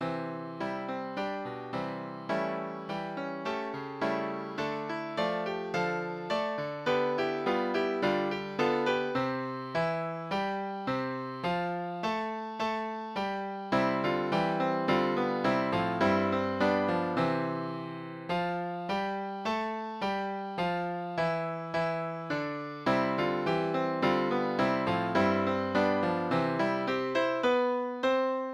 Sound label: Piano; Christ the Lord Is Risen Today